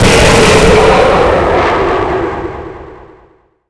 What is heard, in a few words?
portal